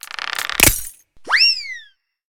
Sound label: wind up whistle